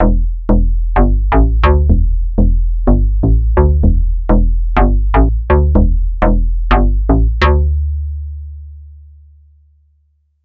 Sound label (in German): fm synthese showcase; yamaha